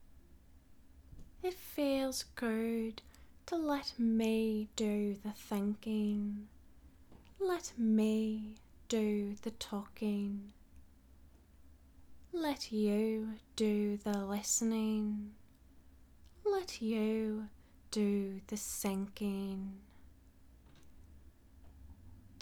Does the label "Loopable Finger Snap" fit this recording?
Yes